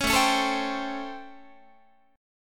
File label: A 7th Sharp 9th, Guitar; A7#9; strummed